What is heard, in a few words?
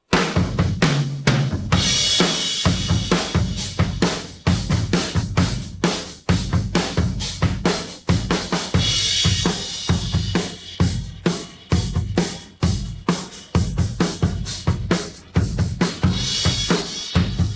drums pattern